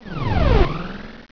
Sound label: enter; bugs